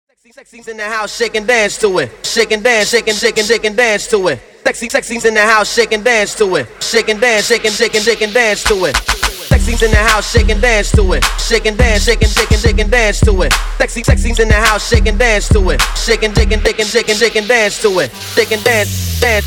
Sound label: Transition 105 128bpm